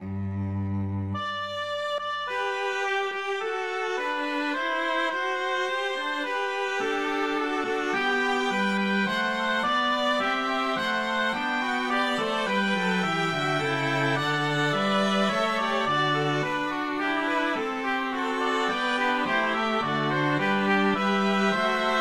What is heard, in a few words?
orchester; HWV259